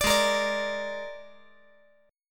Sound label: Ab Suspended 2nd Flat 5th Ab, chord; strummed; JGuitar